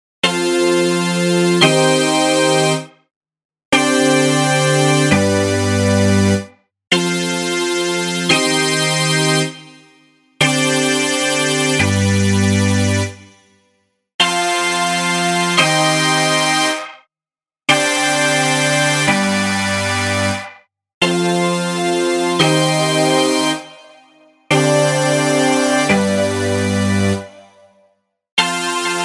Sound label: Organ; RingX